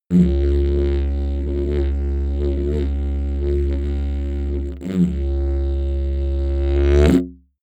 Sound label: sequence didgeridoo single tone sequence